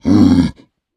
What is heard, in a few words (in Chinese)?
猪灵愤怒声